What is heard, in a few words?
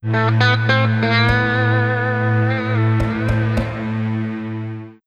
SMS